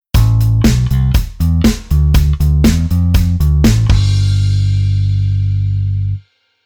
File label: bass